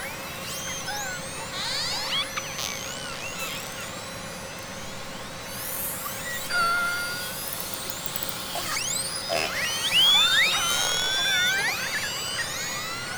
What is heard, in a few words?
killer